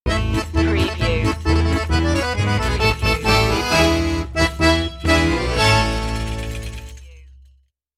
polka stinger

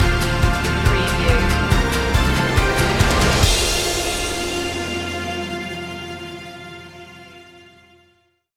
upbeat country fun stinger

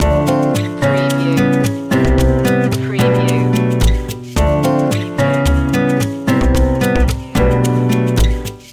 lounge water loop

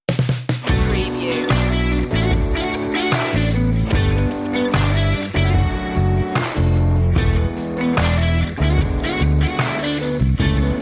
guitar lead

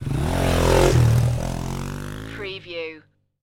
Motorcycle Dirt Bike Drive Away

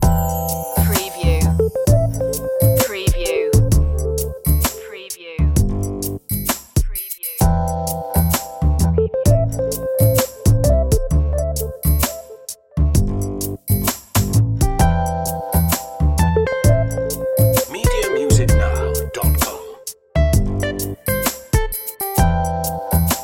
sand and sun loop

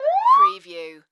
Police Car Siren, Short Yelp

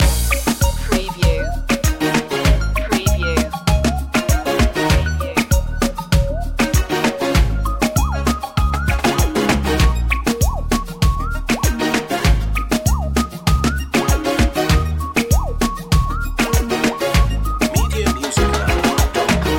la chica loop

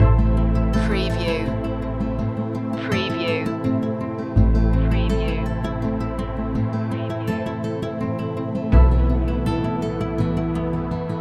oboe in the woods loop

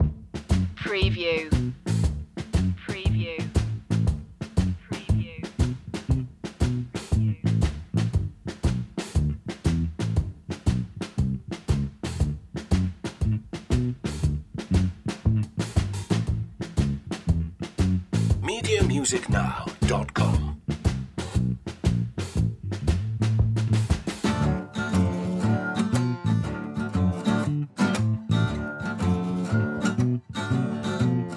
barbed wire blues loop

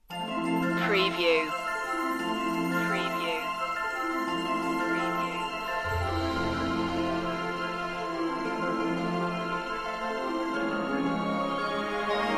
no choir

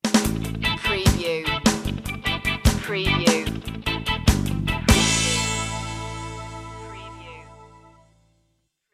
reggae stinger

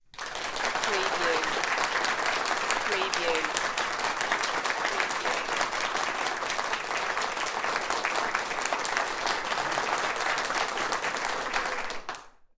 Crowd Applauding

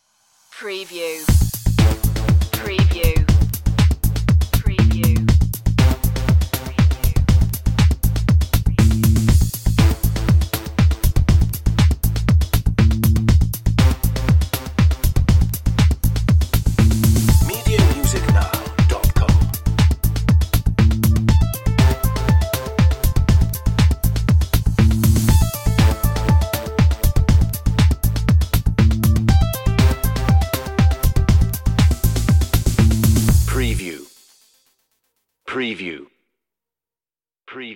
minimalist cut

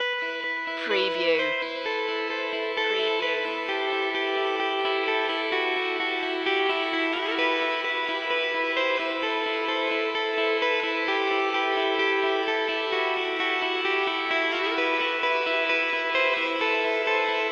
guitar only

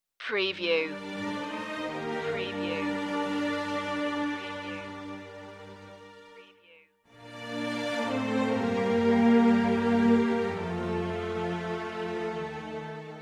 violins phrase only

